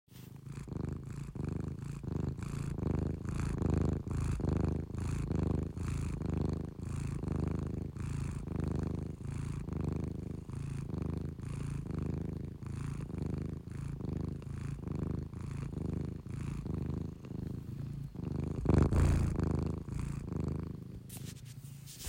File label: real cat purring